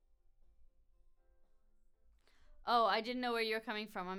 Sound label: BG Vox